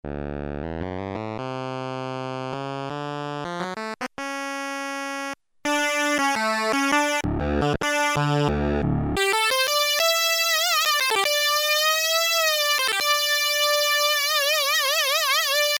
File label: Unison Solo High, Korg PolySix; Factory Presets Bank A